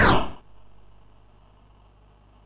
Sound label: Project Firestart, MOS6581R4